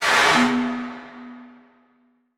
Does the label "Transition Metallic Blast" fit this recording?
Yes